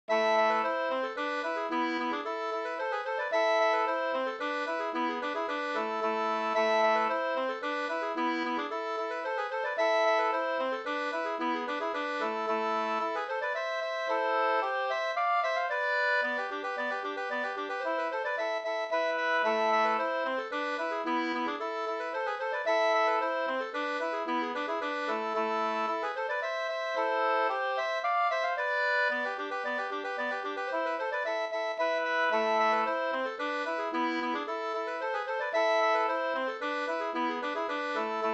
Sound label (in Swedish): slängpolska